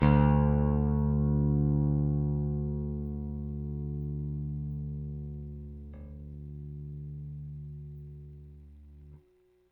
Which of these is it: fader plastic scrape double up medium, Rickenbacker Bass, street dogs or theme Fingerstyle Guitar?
Rickenbacker Bass